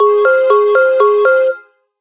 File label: melody